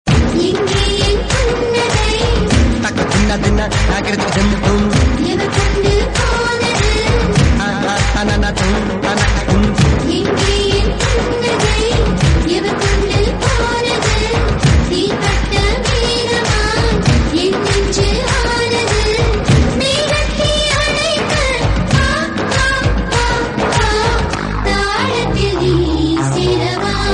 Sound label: Remix Ringtone; Thaalam Movie